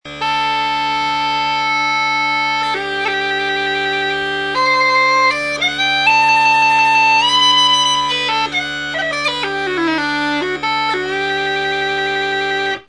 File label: prac drones; adapted from antique "narrow bore" or "flat pitch" designs